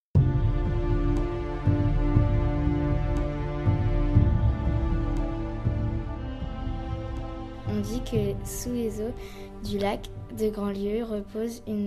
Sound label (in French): Les cloches